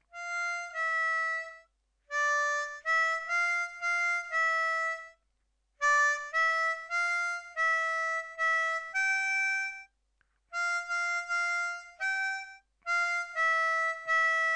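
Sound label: Chorus Harmony, harmonica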